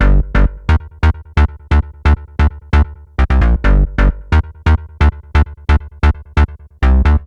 132 House Bass Arp Gmaj; Dance DJ; Digidesign; Pro Tools; Pro Tools Essential Loops

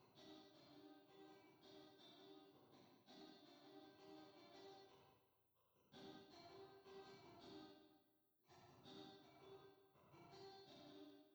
Soul Rhythm Guitar Cm; R&B Funk Soul; Digidesign; Pro Tools; Pro Tools Essential Demos; Essential Loop Demos; Demo Session

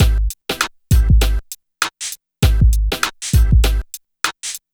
HipHop Hammond Combo A min; Digidesign; Pro Tools Essential Loops

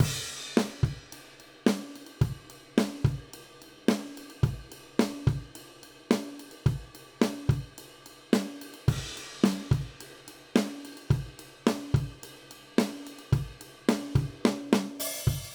Country Drums, Bridge; Digidesign; Pro Tools Essential Loops

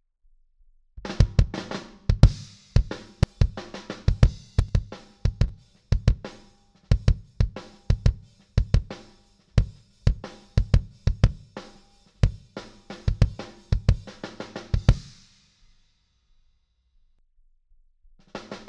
Kick; Pro Tools Essential Demos; Digidesign

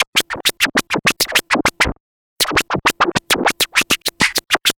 Vinyl Scratch Clap; Digidesign; Pro Tools; Pro Tools Essential Loops; Dance DJ

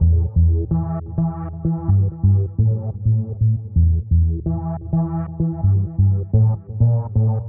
128 Techno Bass Emin; Dance DJ; Digidesign; Pro Tools; Pro Tools Essential Loops

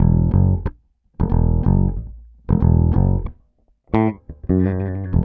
091 Old School Bass F#, E-Bass; Pop Rock; Pro Tools Essential Loops; Digidesign

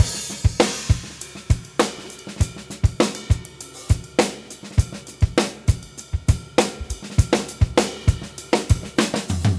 100 Pop Drums; Pop Rock; Moderate Tempo; Pro Tools Essential Loops; Digidesign

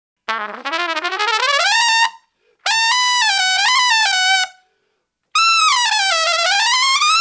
125 NuJazz Trumpet _Emaj; Brass; Pro Tools Essential Loops; Digidesign

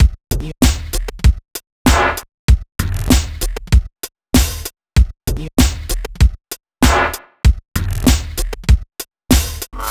097 Pop Drumcuts; Pop Rock; Moderate Tempo; Pro Tools Essential Loops; Digidesign; Drums

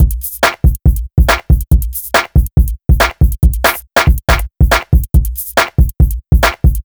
South HipHop Drums; Pro Tools Essential Loops; Digidesign

